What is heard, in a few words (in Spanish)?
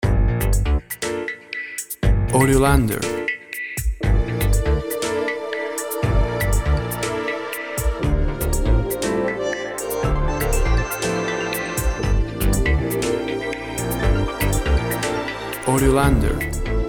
huida bajo la lluvia, Melody with string; Melody with strings and bandoneon, with fast rhythm, ideal for chase and